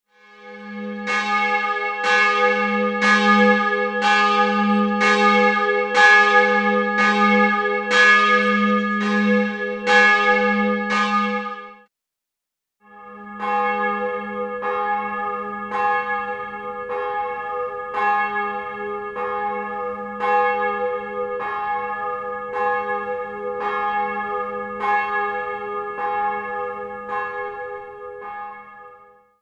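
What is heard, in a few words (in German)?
Glocke vor und nach Umrüstung auf Joch mit Gegengewicht und Fallklöppel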